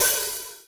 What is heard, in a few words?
Hi-Hat open L